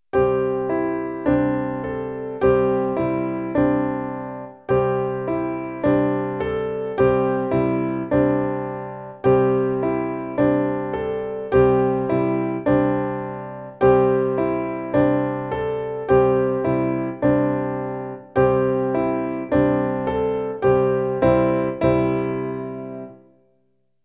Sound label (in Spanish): Canon melodico PIANO